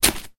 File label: hook hit